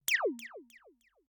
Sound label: laser